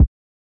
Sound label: Kick, hip hop; STYLE KITS